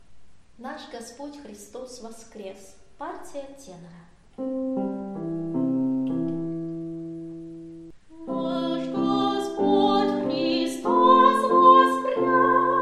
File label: tenor; Nash Gospod Hristos voskres